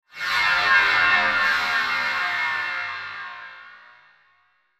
Whoosh Robotic Flange Shimmer Pan; Blastwave

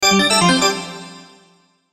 Logo Organ Stab Shimmer Fast; Sonic Logo

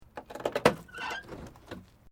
Metal Light Movement Minor

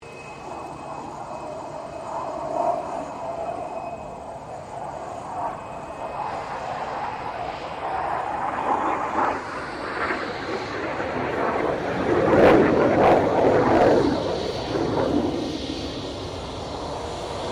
1997 BAE HAWK 127 PASS; Airplanes; Sound Effects:Vehicles

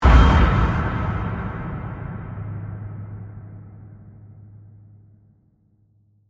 Horror Hit Metal, Shock, Reveal; Metallic; Hits (Sound Effects:Horror)

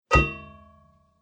Clang; Clang Sound Effects; Impact

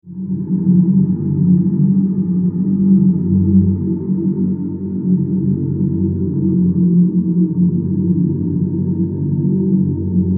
Horror Drone Moans; Evil

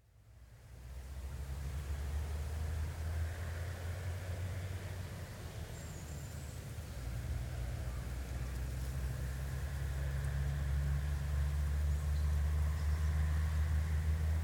Main Road in the country; Recorded on a bend on the A44 near Leominster; Traffic And Car; BBC

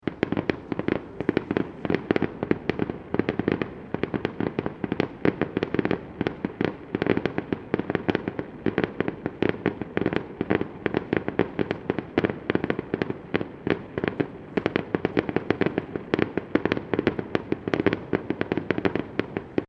Fireworks Small Display Crackles; Backgrounds; CraftedSignals

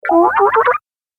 Arcade Game Short Rising Bleeps; Video Game Sound Effects; Retro Inspired Sound Effects - Think Nintendo, Atari, C64; Andrew Fleming

